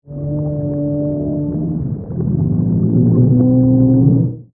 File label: Sea Creature Moan, Horror, Under, Water, Whale, Song; Monster